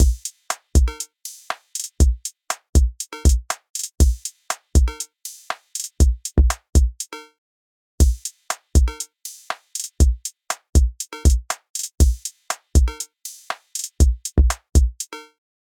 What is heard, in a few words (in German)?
Analoger Drumcomputer